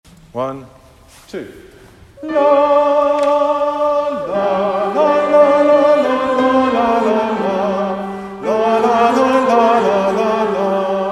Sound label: canto ultreia altos; citysings